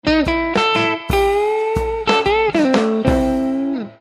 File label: Clean DS1 JCM900